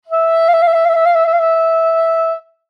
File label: Saxophone Soprano, Saxophone Soprano Trill; Brass; Musical; Blastwave